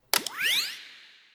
switch on; p00gie

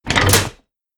throttle on; p00gie

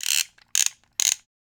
regulators; doctorwho1200

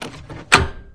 engine release lever; doctorwho1200

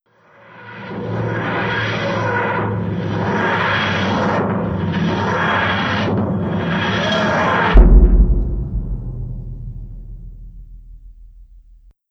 mat fast, tardis; p00gie

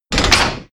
throttle off; p00gie

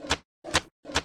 sliders off; p00gie

